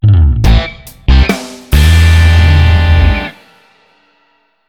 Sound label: Side Case Start Funny; Lost Judgment